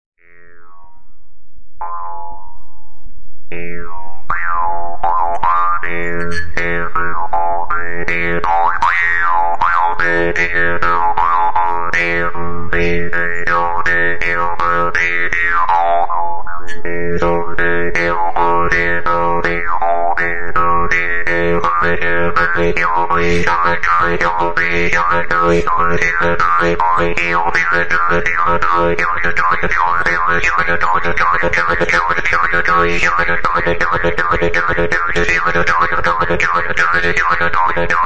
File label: Gopuz